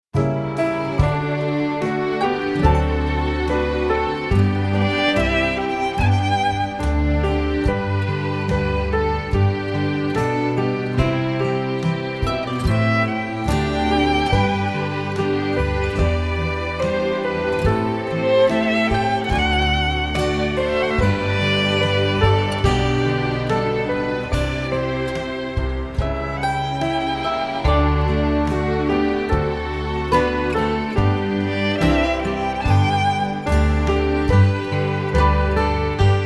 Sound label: Red River Valley,recorder; recorder karate